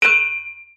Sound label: impact metal pole hit stone ring